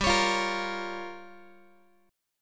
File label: G Minor 7th Flat 5th, Guitar; strummed; 4A,4E,5A,5D,5G#,6C#; Gm7b5